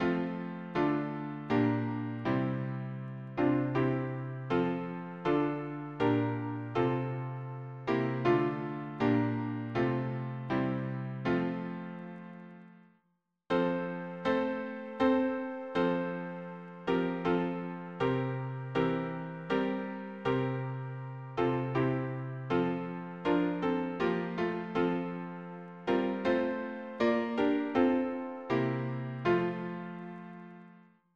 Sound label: harmony piano